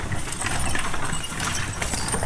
Horse Drawn Wagon; Electa Quinney

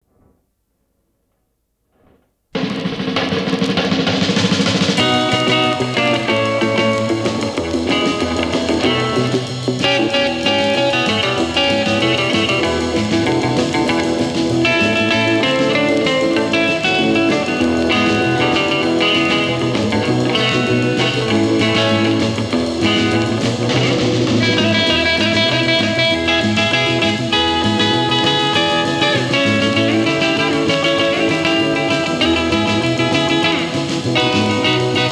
Twang Instr

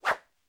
Whoosh, Airy

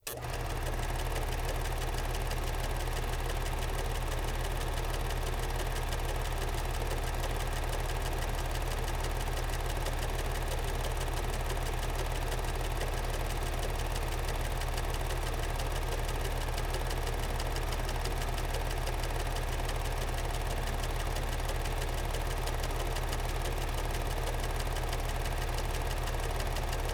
Projector Film Slo Mo; Poetry Project

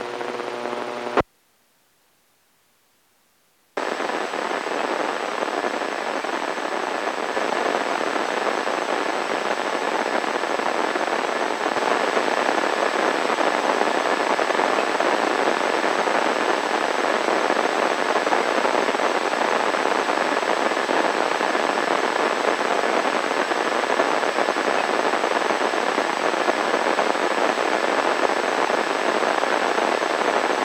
Static White Noise Basic; Poetry Project; Crowd

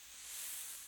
Air Brush Bursts Var Long; Electa Quinney

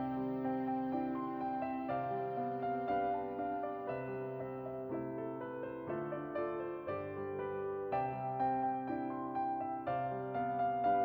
Music Only; Electa Quinney

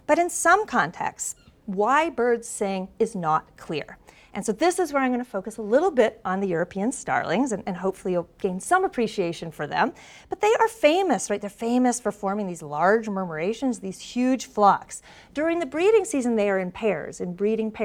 Birds; University Place; Why Birds Sing; RX 9 Connect

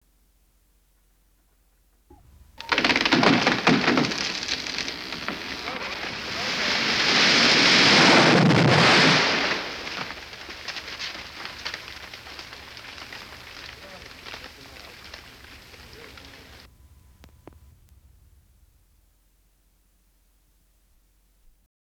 tree coming down; JERRY APPS WINTER

